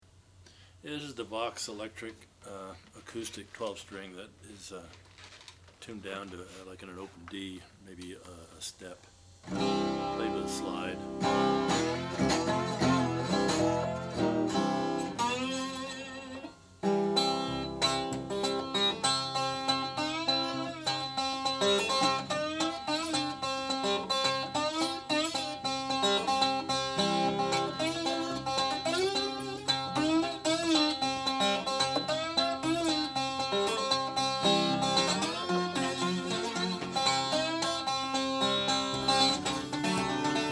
vox folk 12 electro natural, Guitars 12 String Electric & Acoustic; Vox V240 Folk Twelve Electro c 1967